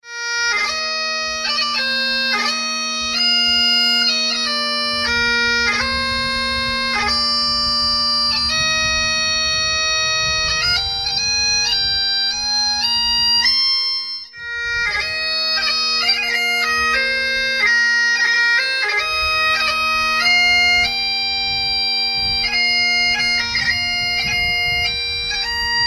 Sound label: ballads, Bagpipes